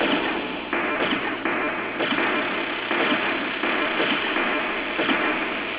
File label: cranes; ff6